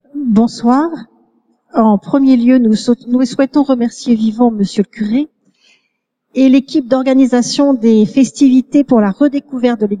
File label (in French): accord vocal